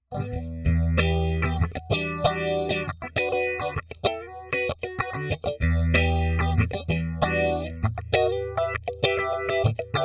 Phaser

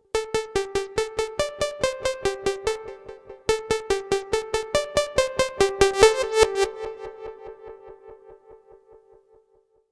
Sh-4d Model Sync Reverse Pluck

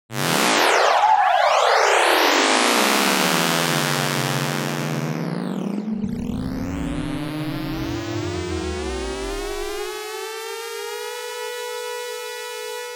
Arturia Pigments 3 Harmonics FX Sound

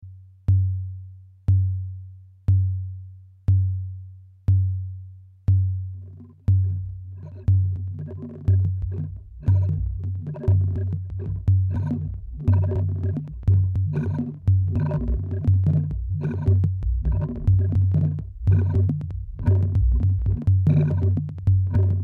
Particle Drum

